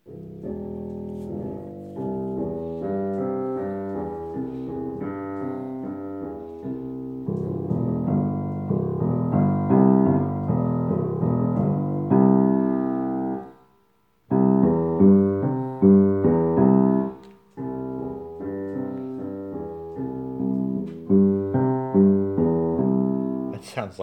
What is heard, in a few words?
bass